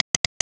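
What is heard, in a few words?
double click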